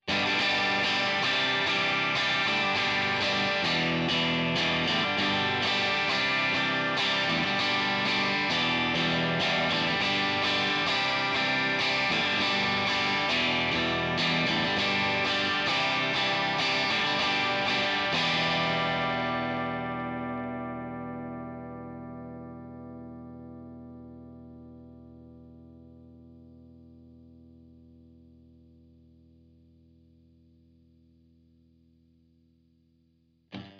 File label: Fender Treble Dirty; Gitarrenvergleich